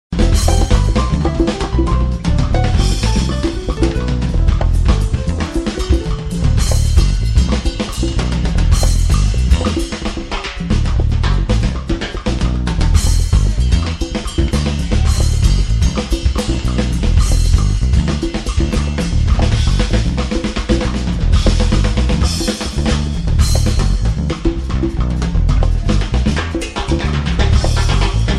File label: Drums